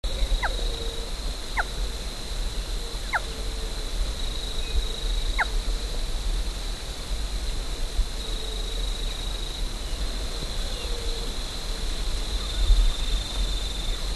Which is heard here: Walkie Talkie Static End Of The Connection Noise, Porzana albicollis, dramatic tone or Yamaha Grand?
Porzana albicollis